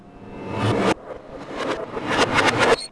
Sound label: trashcan; soundscape